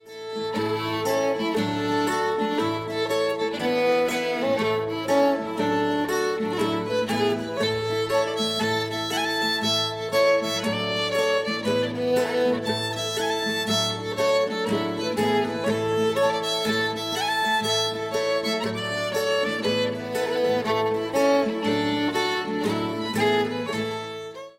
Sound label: Strathspays